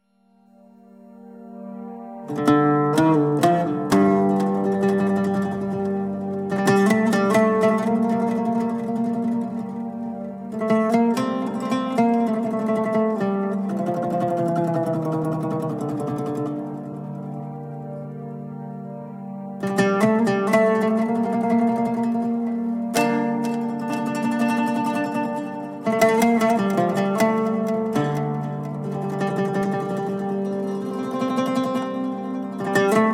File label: Oud Inception